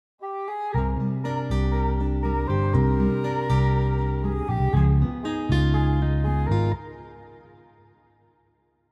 spookytown bgm 120bpm EbMinor ish; 14beats; chicory a colorful tale; ps4

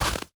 walk snow

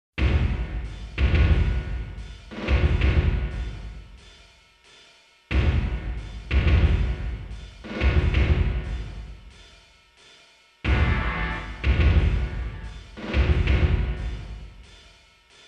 Final Match Sudden Death MUSIC; Buriki One World Grapple Tournament '99 in Tokyo

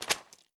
mud footstep

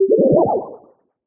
Celestial Charge Activate

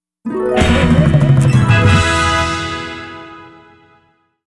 Mission Complete!; the simpsons hit run; ps2; Large American Gas-Guzzling Vehicle

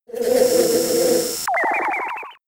Defend Order; attack moves; Pokemon; Gen 5; Blk Wht Blk2 Wht2

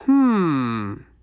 Emote Male Confused; Idle Champions of the Forgotten Realms

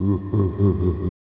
Evil Laugh; dossun ganseki battle 1994 snes

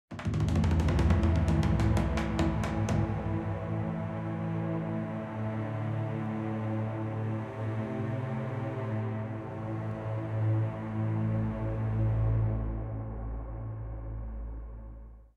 Spotted Stinger; Macau Fort Slave

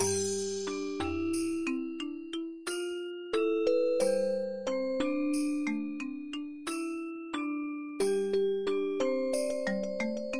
Lullaby

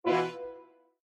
Battery Critical; Landscape

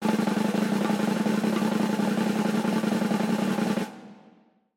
Snare Roll; Idle Manager; Android

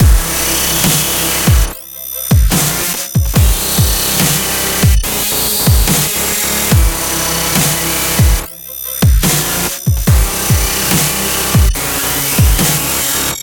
New High Score; AIPD Artificial Intelligence Police Department

